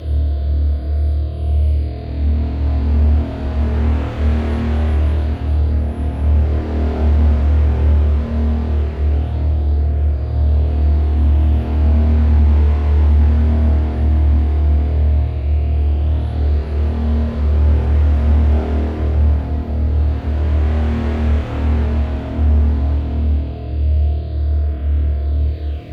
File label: gauss idle; doom gauss